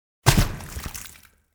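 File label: heavy object fell into water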